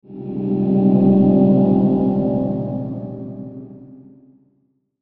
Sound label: Swell Musical, Swell Musical Dark Flange; Swell Sound Effects; Blastwave